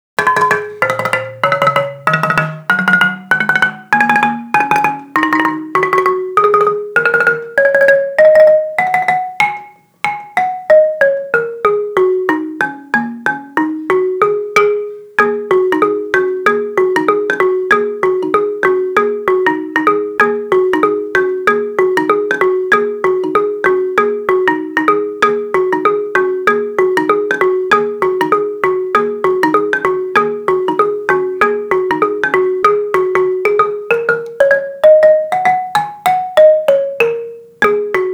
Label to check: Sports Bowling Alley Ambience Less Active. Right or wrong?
Wrong